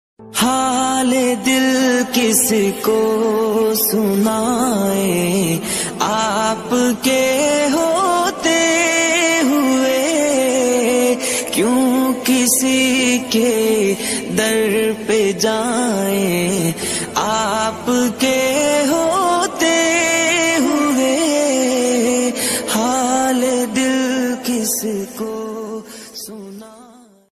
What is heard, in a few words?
islamic najam naat sharif beautiful heart touching islamic najam naat sharif ringtone